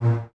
BSS A#2, String Ensembles, Basses, f Legato; Miroslav Vitous; 90 s Sample CDs

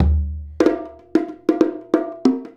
Bonga, Percussion, Drums, Bongos; Sonic Foundry (Sony Creative Software); Essential Percussion

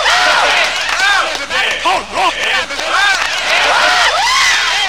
FX VOX; Big Beat Samples & More; AKAI; OTHERFX KIT

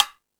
Percussion, Drum Machines E-MU Pro-Cussion

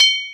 PRC E, SHO PERC PAD; Retro Sampler

